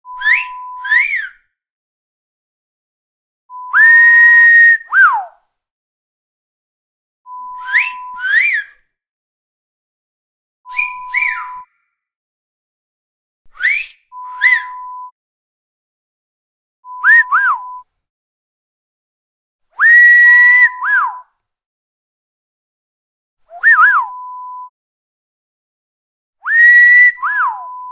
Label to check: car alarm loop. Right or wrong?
Wrong